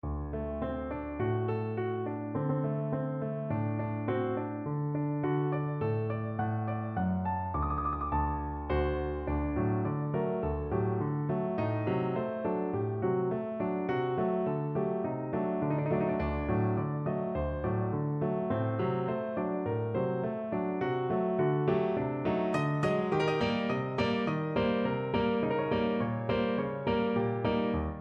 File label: improvising on a dominant